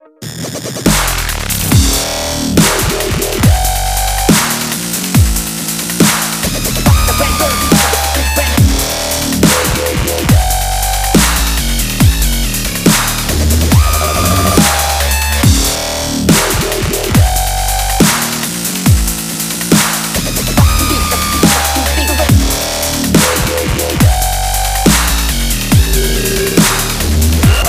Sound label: dubstep; dirty beats rough bass